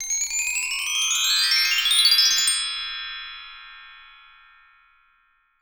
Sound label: BRASS M, TREE BELLS; Voice Crystal - Steve Reid Definitive Percussion